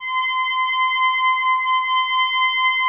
PAD C7

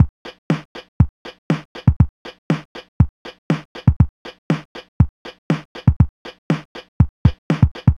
Apple II Rock Beat, Drums, Chiptune; Sonic Foundry (Sony Creative Software)

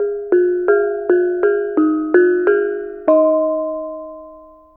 BONANG; Spectrasonics; Heart of Asia; BONANG PH

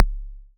KCK, Yamaha TX81Z synth drums

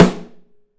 Drums, STEEL; 3D Drums

